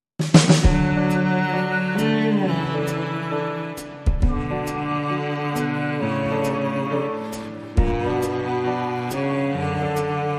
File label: Clean Instrumental